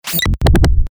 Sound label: Behold My Thud; Best Of N2O Library; Breakers & Transitioners